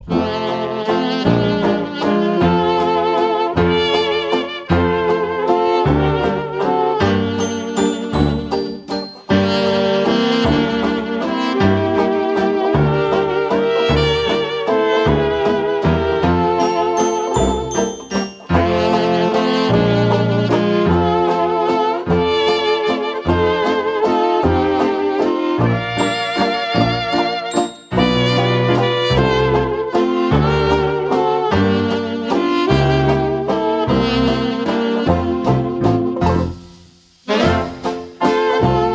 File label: Jazz Orchestra, Waltz Medley